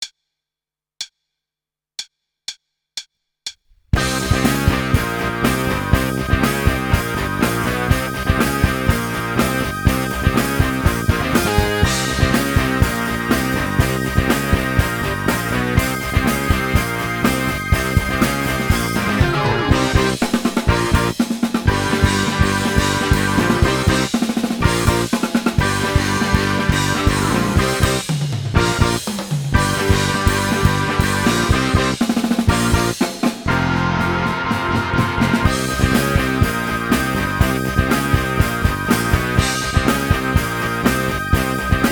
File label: Hardrock